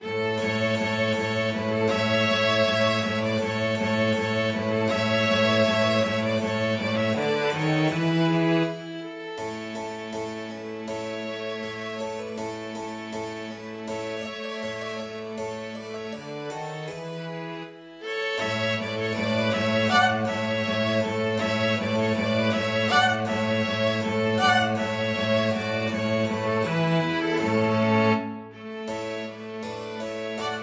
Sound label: vivaldi spring piano quartet